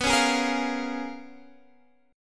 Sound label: Bb Minor 7th B; Bbm7/B strummed